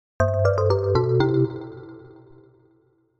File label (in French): synthe; Logos